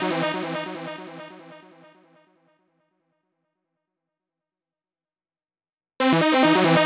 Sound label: Techno Synth; Sonic Foundry (Sony Creative Software); Trance NRG VOL-2